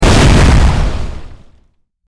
bomb explosion

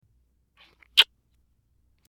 Kissing

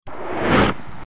a car whizzing by

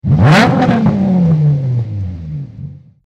car engine rev

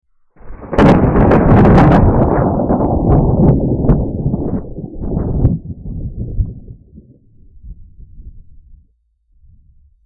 Thunder

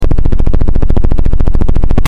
Helicopter flying

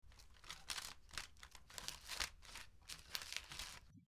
Papers shuffling

